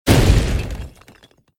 CLOUD; Fruit Tumbling

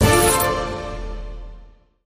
reward; Sheriff Of Nottingham

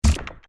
UI Stop; Hot Spin; CQ9

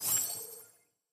minitable in; Great Book Of Magic Deluxe; Wazdan

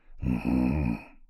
voice hum; Sheriff Of Nottingham

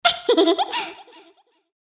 moan; Imperial Girls

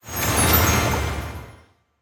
gambler win; Hungry Shark; Wazdan

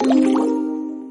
win multiline; Hungry Shark; Wazdan

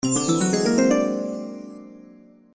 sun reveal; Horoscope

